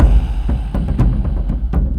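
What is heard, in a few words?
Music Shield; from dust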